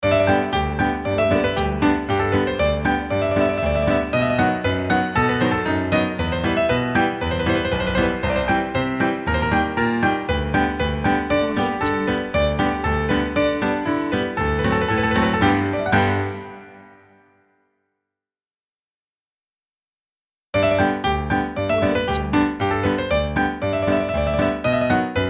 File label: piano logo; stars and stripes; drtimwaters